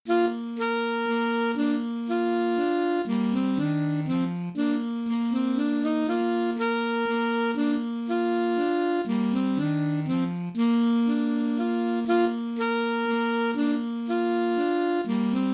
easy sax duets

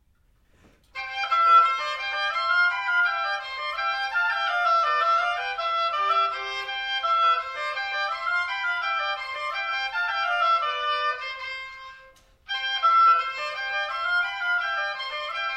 rigaudon oboe duet; chedeville

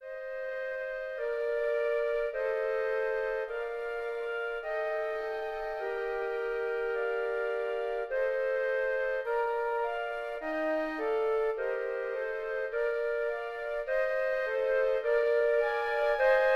flute quartet; Brahms O Bone Jesu

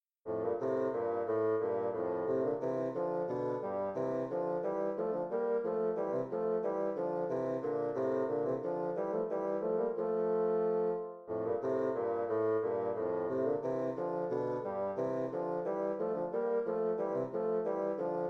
air en rondeau, 2 bsns

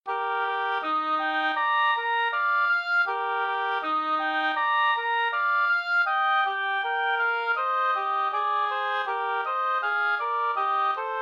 easy allegro ob duo in Eb major; kalliwoda op178

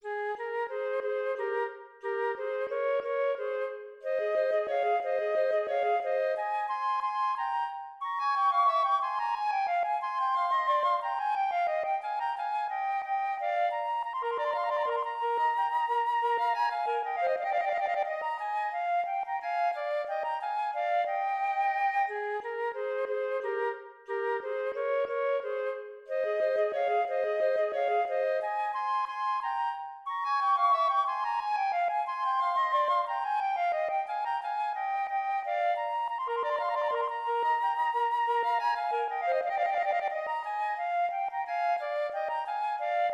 duet, flcl; molter

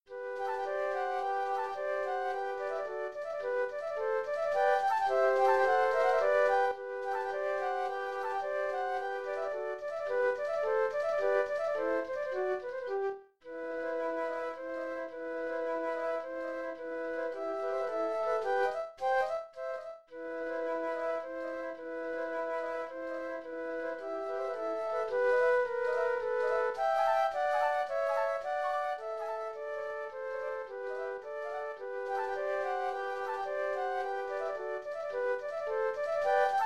3 flutes; song of the lark

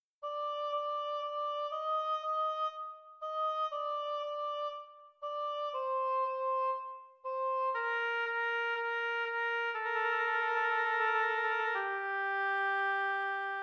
ob cl bsn; abbe stadler

